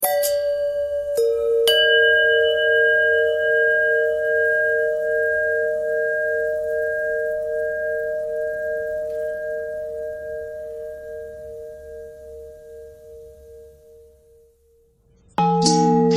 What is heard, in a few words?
long bell bend threads; bells